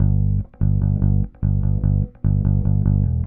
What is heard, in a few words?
Bass, Indie Rock